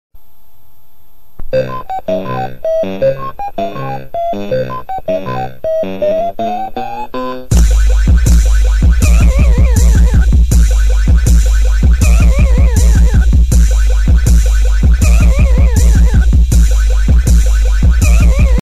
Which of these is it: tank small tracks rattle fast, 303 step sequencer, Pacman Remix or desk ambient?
Pacman Remix